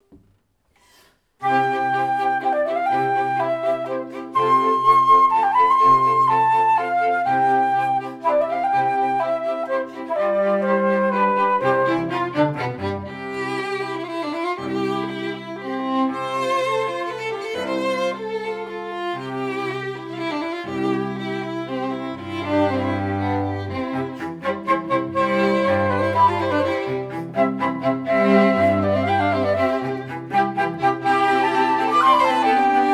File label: Mozart flute quartet